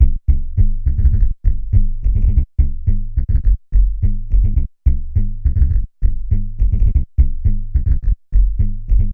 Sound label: synth bass; REMIXABLE COLLECTIONS